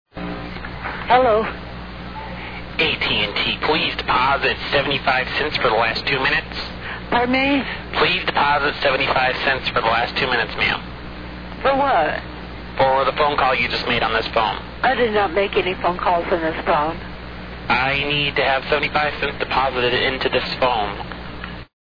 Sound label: deposit 75 cents